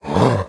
zombie

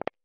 btn click

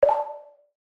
reward claim

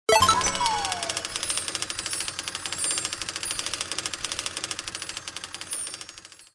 medium win

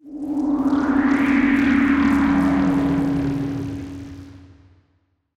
space ufo

